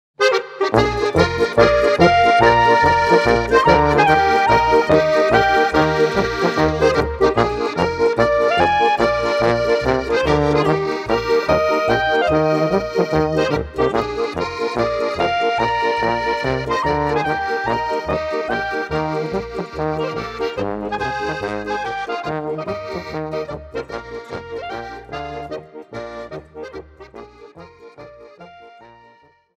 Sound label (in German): Polka